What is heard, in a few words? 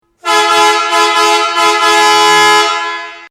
Emergency Dual, horn sounds